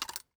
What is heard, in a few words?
rotate weapon, ui; Counter Strike Model Pack; CS:GO; panorama